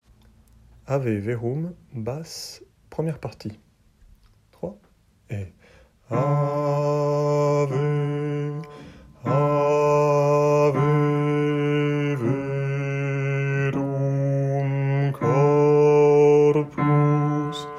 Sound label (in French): Ave verum basse; Mozart; Ave verum P1